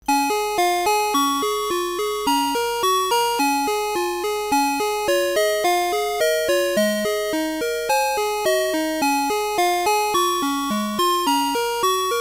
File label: Chord A